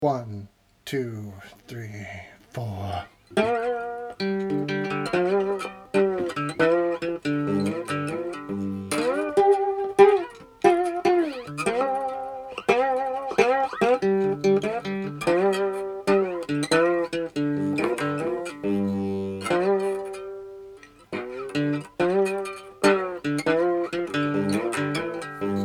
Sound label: Slide acoustic 71bpm Key of G; admiralbob77